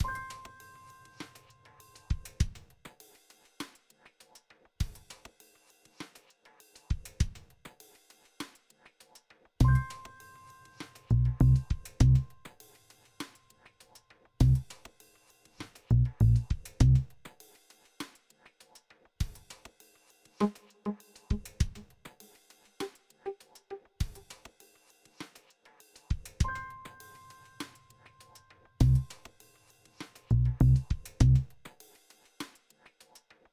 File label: Quest Base Loop